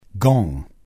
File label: gong; bbc